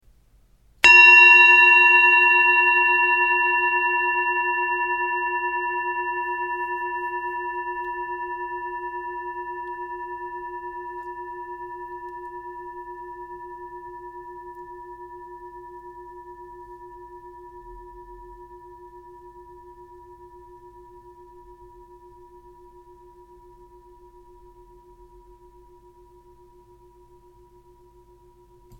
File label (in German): Tibet Klangschale